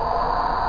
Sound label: Flow; MacPipes 2.0